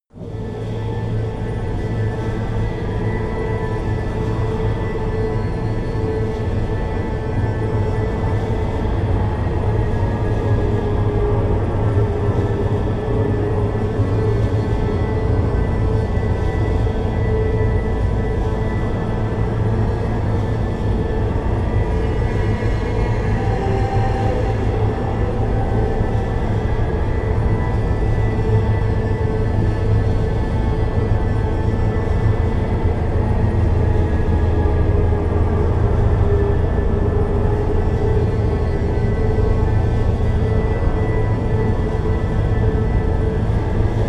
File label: drone wind channel